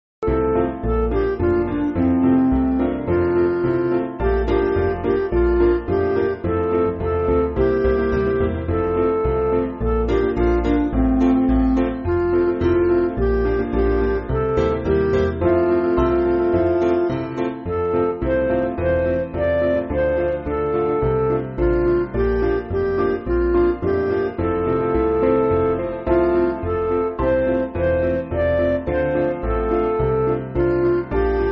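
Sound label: Midi, Music Backing Files for Churches; Go Tell It On The Mountain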